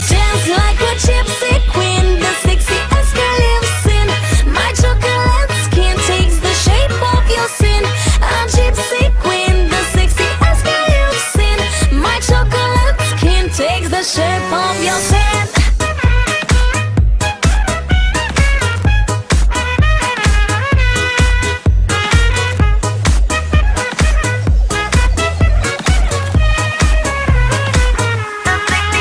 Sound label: Is Calling Ragtime